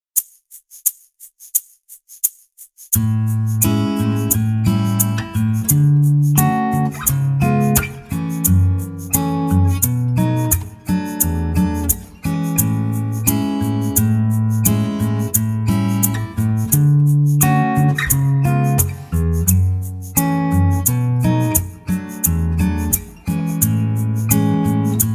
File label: Solo Playback